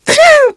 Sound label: engineer pain severe